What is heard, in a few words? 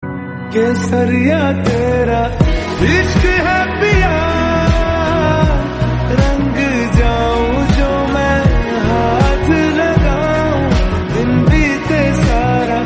slowed reverb